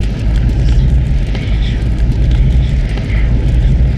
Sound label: Maelstrom Fire